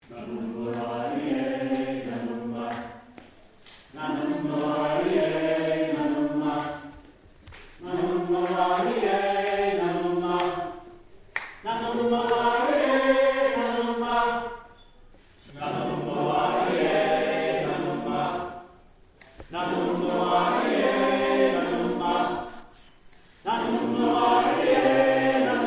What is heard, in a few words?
lester Nanomba